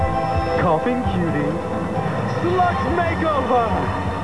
sludge

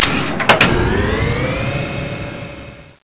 elevat

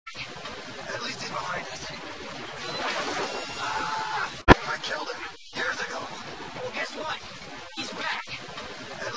lo-fi; hes behind us

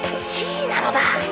shh

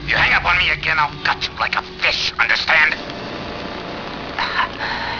scream

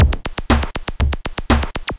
electro 120

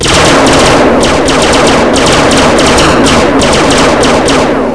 shoot

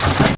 close

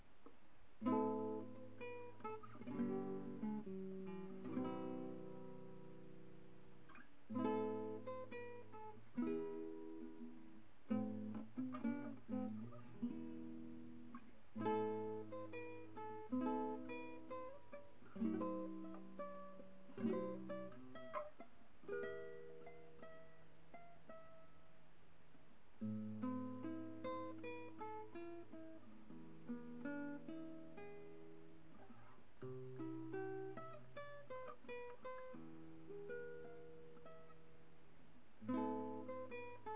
Guitar

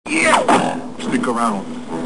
stick

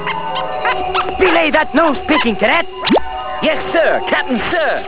nose picking

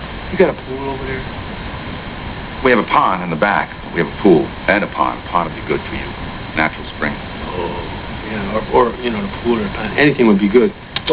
pool

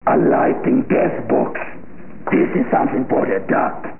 deathbox

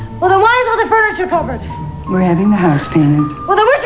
boo

